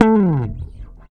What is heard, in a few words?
REAL BASS, BASSLICKS; Ueberschall; Jam Box